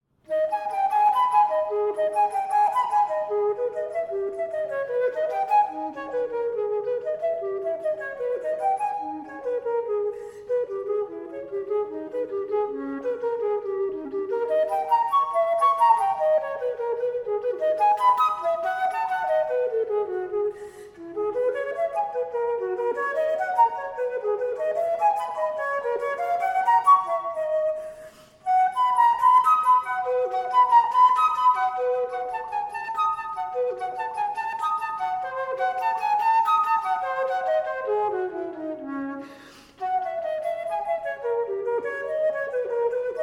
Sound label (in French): Solo pour la flute traversiere Partita in A Minor Allemande; BWV 1013